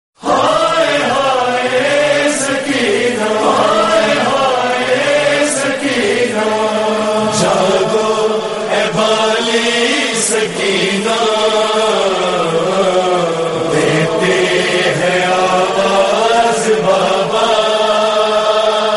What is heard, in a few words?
noha tune ringtone